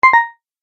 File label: Tap Select, button; multimedia sound effects